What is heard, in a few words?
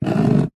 office handwriting pen draw line on cardboard box